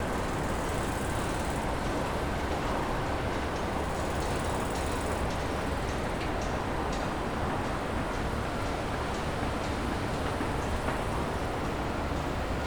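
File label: airfield hangar ambience; War Thunder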